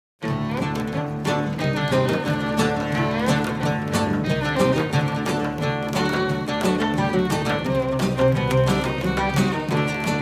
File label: Freilach